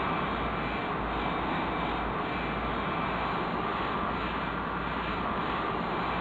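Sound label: Engine Thrust Exterior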